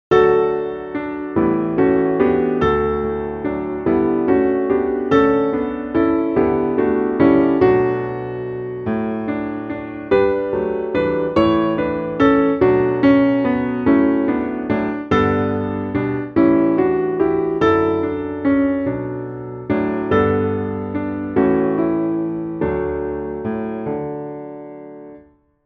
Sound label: Proclaim piano, choir; Memorial Acclamation - Piano